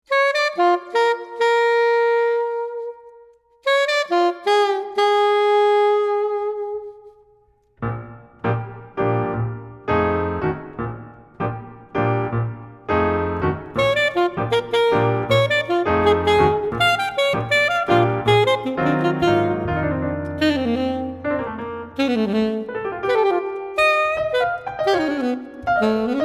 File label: Alto; sax